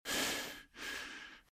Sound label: RadwinIsland